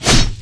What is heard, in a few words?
MOB BATTLE AXE, Battle Mob Weapon